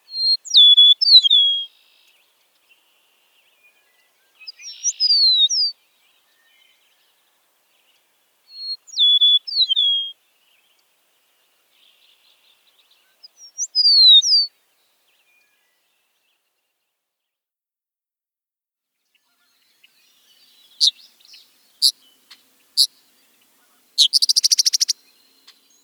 Eastern Meadowlark

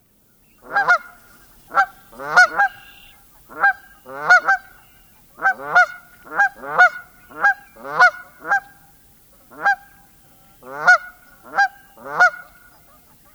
canada goose

